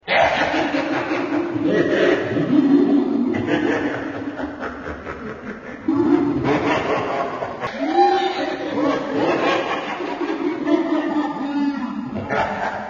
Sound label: Horrible Laugh Track